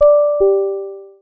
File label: DOOR CHIME; WMATA